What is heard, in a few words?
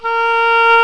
WINDS & BRASS